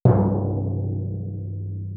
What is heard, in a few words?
timpani